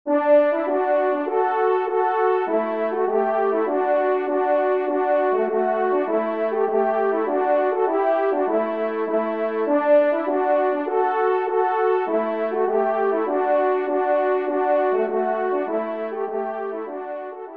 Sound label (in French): Tambourin Do Maj ENS; 4e Tambourin; Corrette